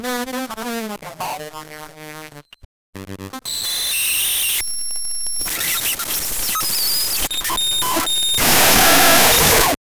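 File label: simulate an ai malfunction, glitch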